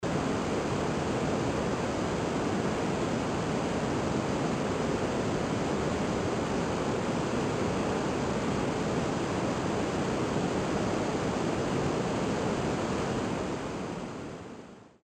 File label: whish white noise